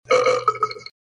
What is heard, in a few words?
burp